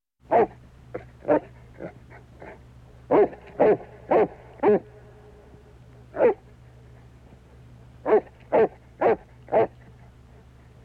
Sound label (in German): bernhardiner, Tierstimmen